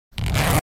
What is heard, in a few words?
Zipper